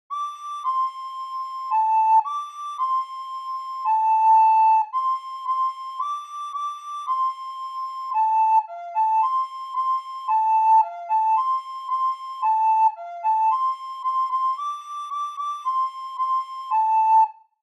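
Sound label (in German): Laterne Laterne Blockflöte solo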